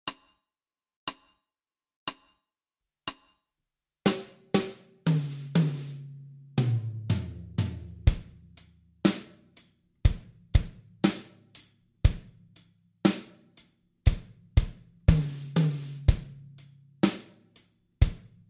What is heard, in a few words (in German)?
Uebung Solo